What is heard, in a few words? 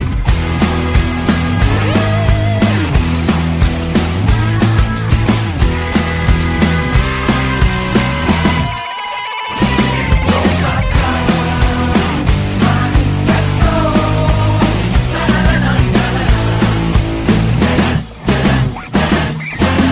malayalam rocking ringtone